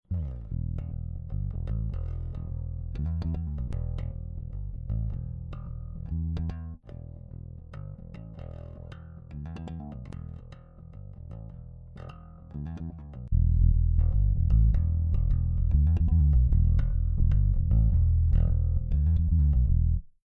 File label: Ampeg 4001 Flat Locut Low Boost